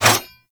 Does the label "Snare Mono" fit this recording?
No